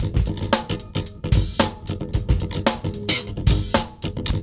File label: LOOP, Funky Ass Loops; East West